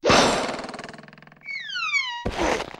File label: big hit; Crash Bang Boom